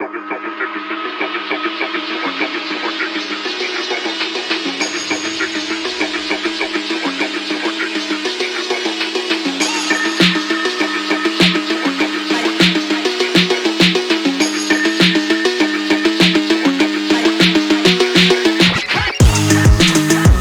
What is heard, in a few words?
crazy drift phonk